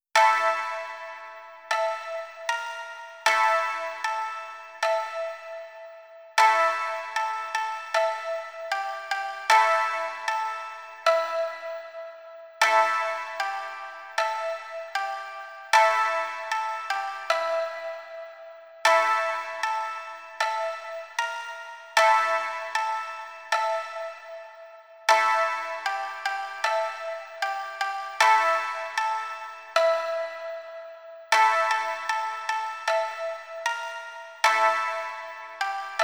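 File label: syamisen kagome, shamisen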